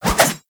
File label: Pole Arm Spin Whoosh